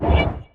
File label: sent